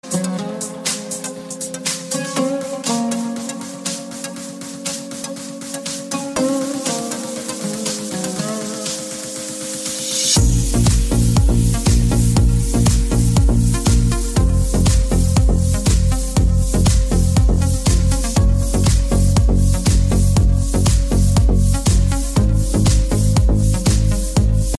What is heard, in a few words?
arabic